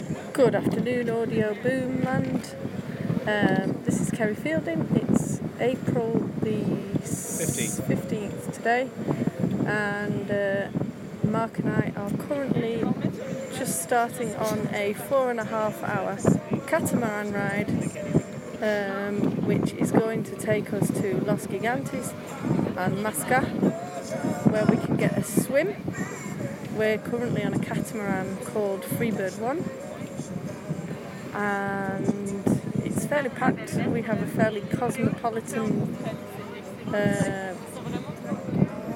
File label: boat trip